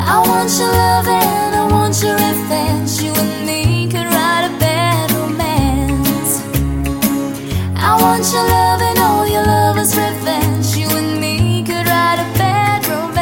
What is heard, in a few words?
acoustic version